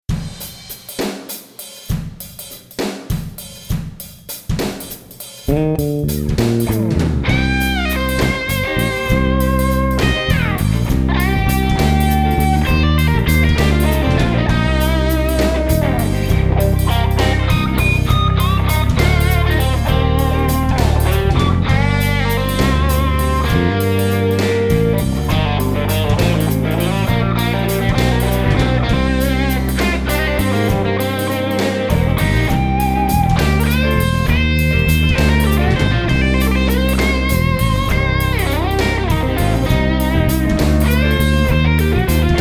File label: MAHOGANY